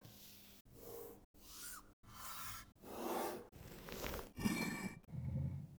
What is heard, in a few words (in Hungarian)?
skala; mate skala